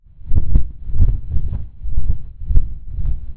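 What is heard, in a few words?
ambience wind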